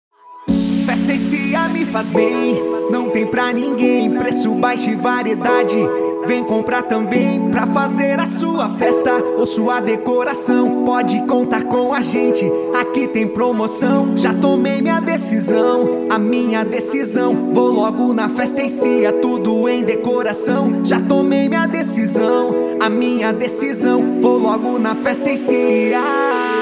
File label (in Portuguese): Jingle; Festa e Cia